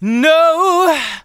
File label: vocals sung F#; Techno Trance Essentials